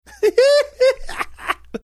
funny laugh

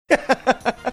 chuckling

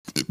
tiny little burp

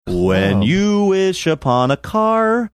singing when you wish upon a car

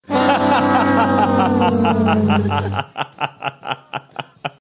fail horn laughing

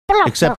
imitating marsupial gurgle sound

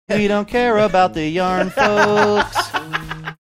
singing we don't care about yarn folks

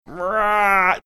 mimicking loud yelling; theodores

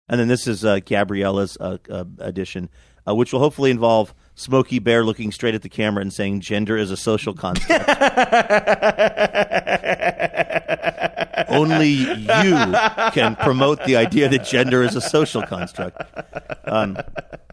having good laugh; smokey bear

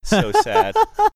short funny laugh

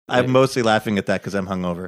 im mostly laughing because im hungover

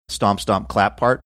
stomp stomp clap part

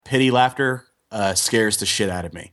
laughter

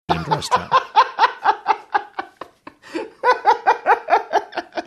having good laugh

